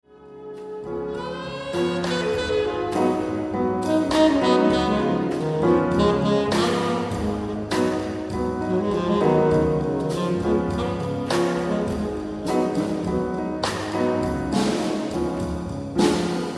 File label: sax w choir